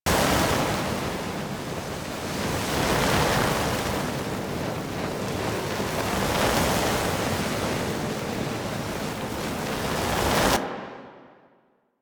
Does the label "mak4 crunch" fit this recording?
No